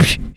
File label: Beatbox Snare